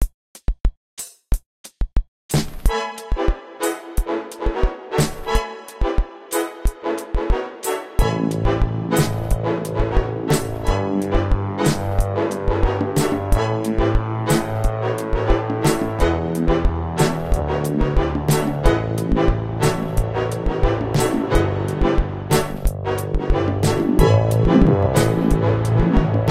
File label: horn funk